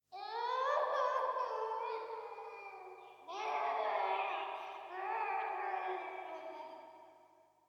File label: Baby Crying In A Dark Corridor